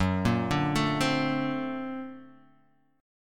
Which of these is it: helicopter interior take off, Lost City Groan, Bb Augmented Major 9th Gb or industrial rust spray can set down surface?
Bb Augmented Major 9th Gb